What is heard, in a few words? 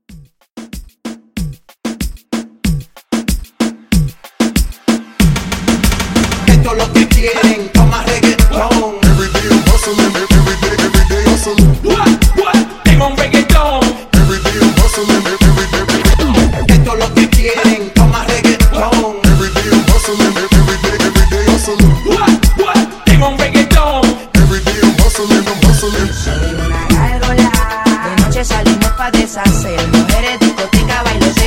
Reggaeton Pop Re Drum 94